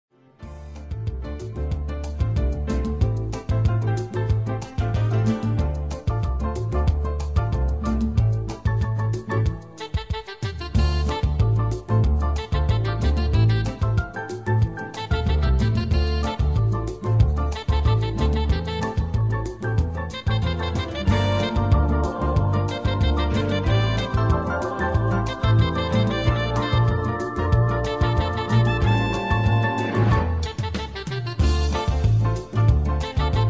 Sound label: Samba